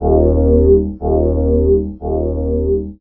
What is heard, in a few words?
suit, items; quakemapping